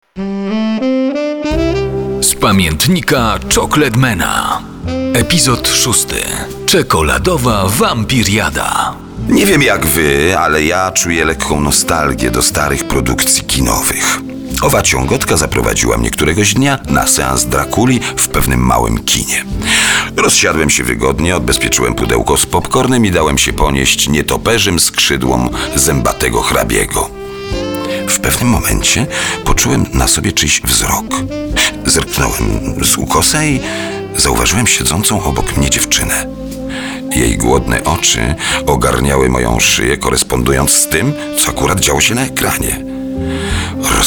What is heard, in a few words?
SAX